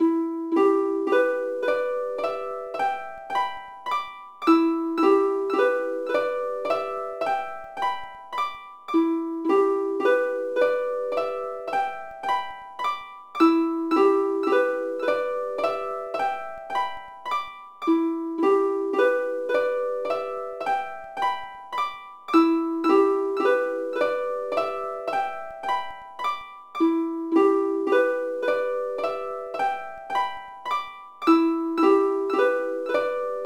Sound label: BGM Clouds Loop; build a bear workshop welcome to hugsville; nds